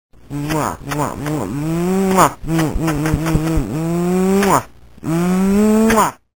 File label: kisses warm